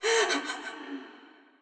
town scared sob; soundscapes; occultists

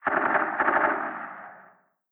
fog shooting

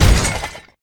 run

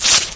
hit, imp; doom3

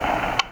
radio static; snds jack gmod

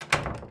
door close

